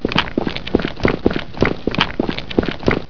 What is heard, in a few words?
WALK